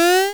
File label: jump; Flopsy